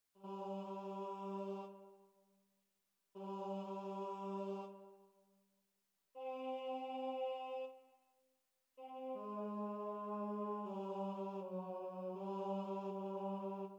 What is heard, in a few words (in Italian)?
coro bassi; Idomeneo